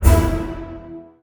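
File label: euphonium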